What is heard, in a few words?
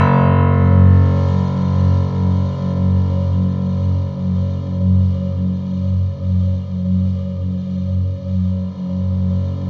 F#1, Synth Line; 90 s Sample CDs; NiceBeats; SynthLine VOL-22